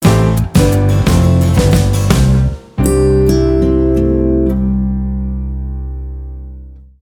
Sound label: Level Lose